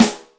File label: RI Metal, Yamaha DTXpress Acoustic Snare